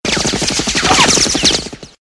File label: machinegun ricochets, weapons